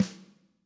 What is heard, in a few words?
Drums